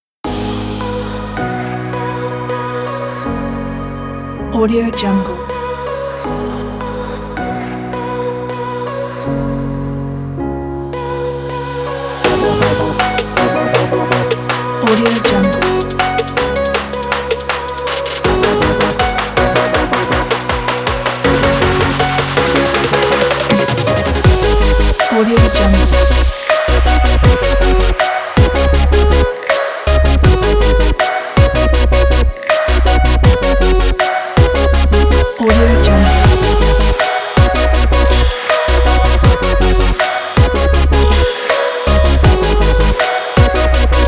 Future Bass 80bpm

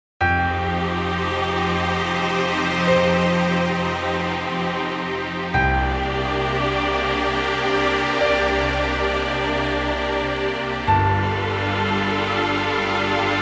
Ambient Indie Background (Relax Music)

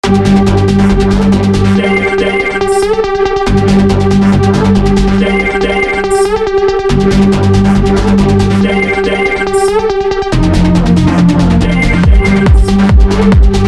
elektro dance

